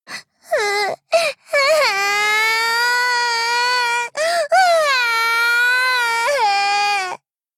cry

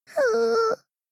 cry

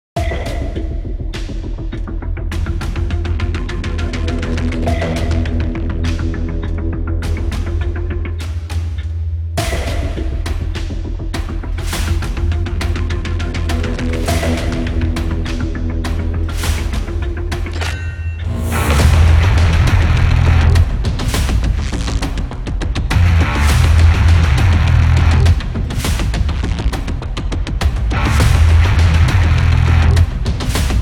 bgm battle deep echo

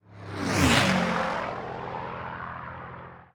plane

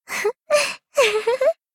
laugh

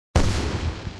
firework

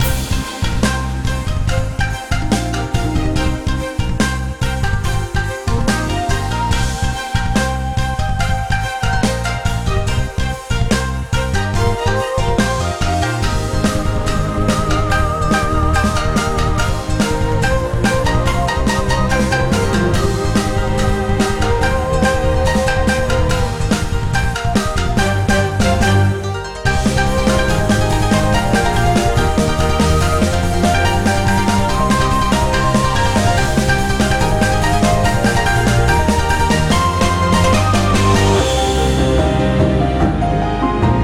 bgm theme loop; longgong

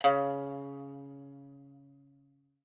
pipa

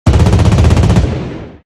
battle cannon air

